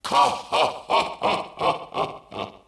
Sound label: attack laugh